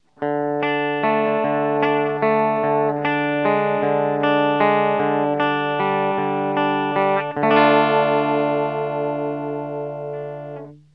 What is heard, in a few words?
clean